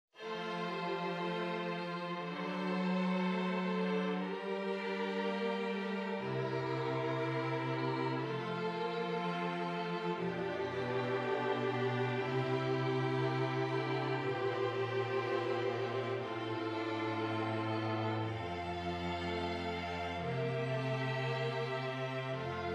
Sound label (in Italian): esercizi di contrappunto semplice A quattro voci